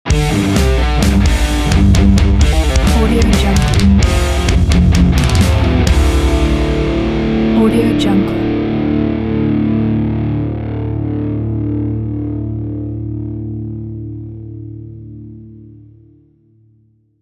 metal guitar logo reveal; vibecode

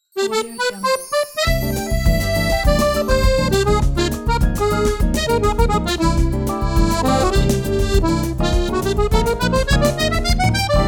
bossa intro

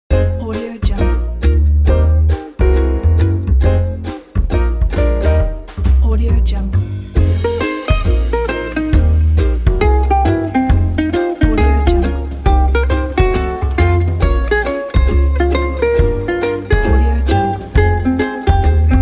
jazzy ukulele background

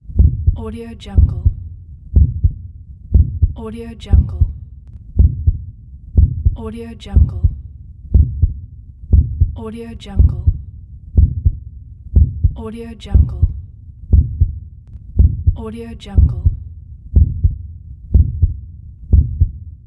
heart beat

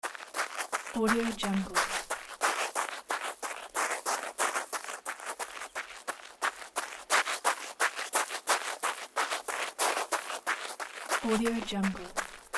running

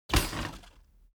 impact scrap drop metal and wood soft small

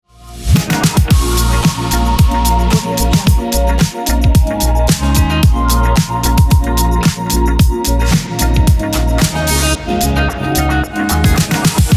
fashion chill logo

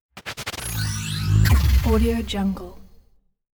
shot

